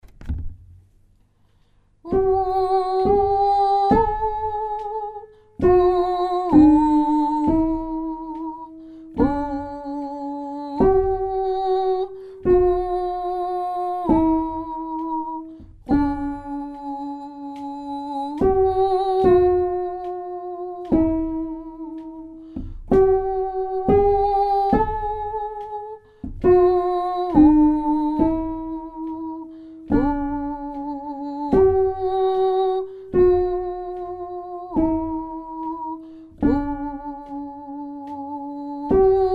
alto, chorale

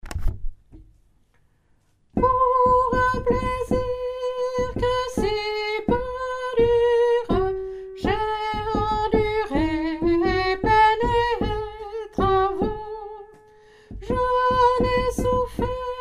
soprano, chorale; pour ung plaisir

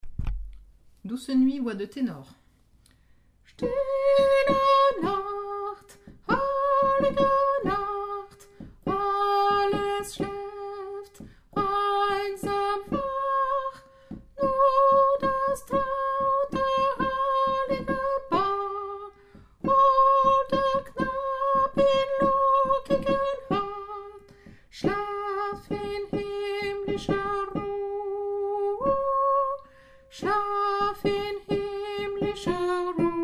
tenor; stille nacht

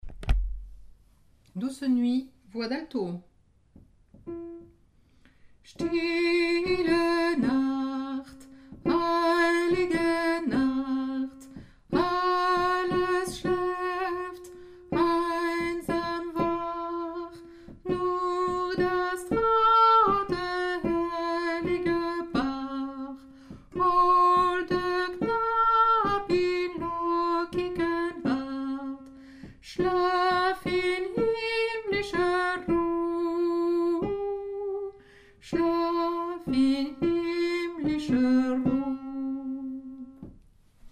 alto; stille nacht

